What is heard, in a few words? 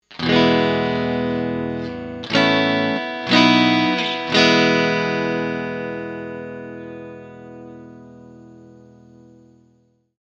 Ceramic Clean